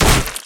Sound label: Melee Physical Heavy; Kilta